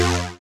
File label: SYN Flange it; Best Service Gigapack 1